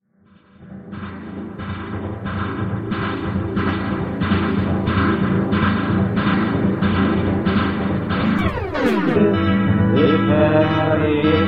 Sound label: Simple Blues